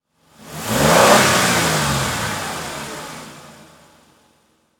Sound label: Transportation Jeep Commander Rev Long